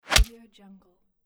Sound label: wooden arrow flesh impact